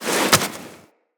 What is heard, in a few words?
Item Pickup Foley; Characters; Tenno